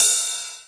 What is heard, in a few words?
ride